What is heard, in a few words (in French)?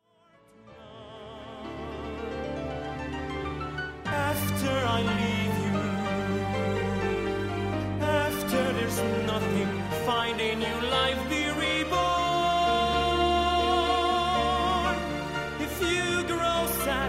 chant